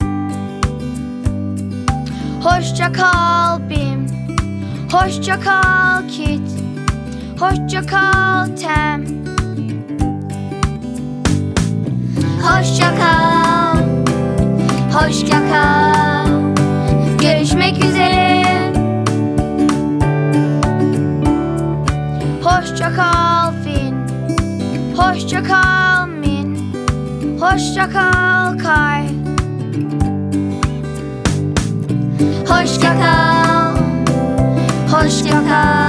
song turkish

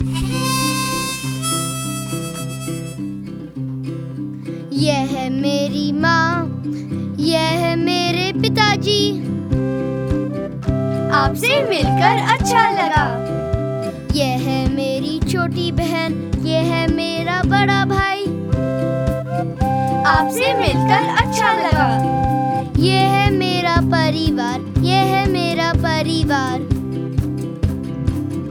song hindi

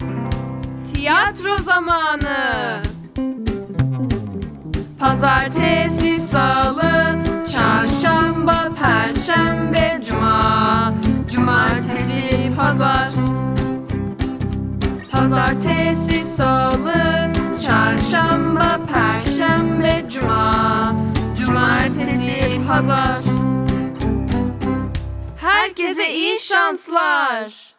song turkish

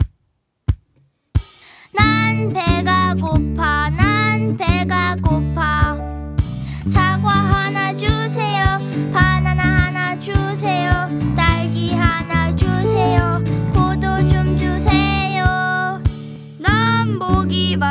song korean

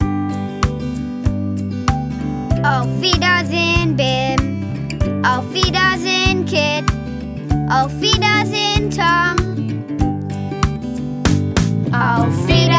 song german

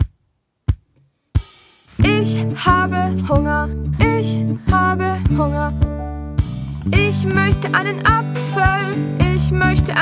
song german

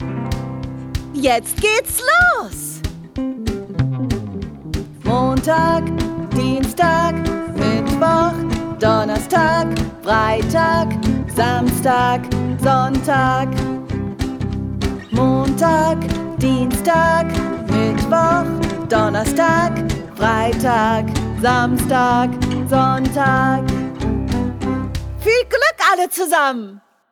song german

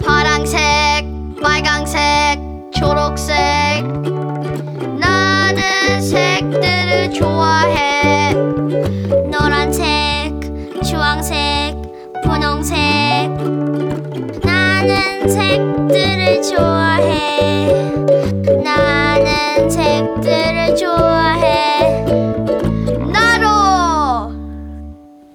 song korean

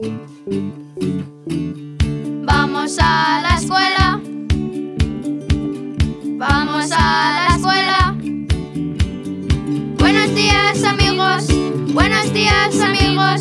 song spanish; Polyglots

